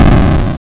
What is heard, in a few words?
explosion